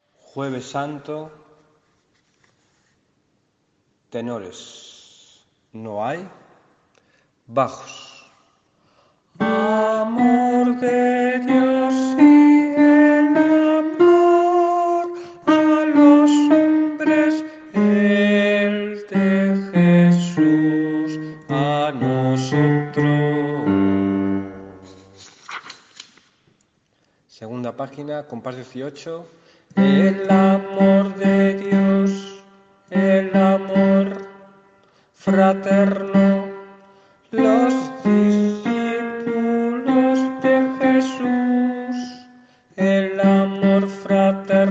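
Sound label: tenor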